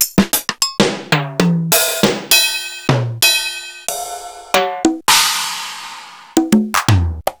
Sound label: Roland Tr-6261